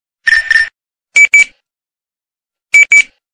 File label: beep nextel; Radinho